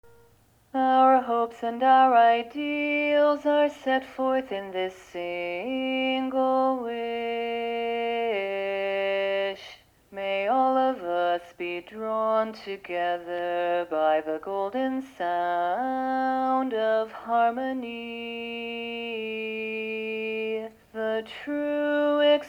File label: Voice of Harmony Bass only